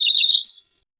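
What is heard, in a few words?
birds chirping in a forest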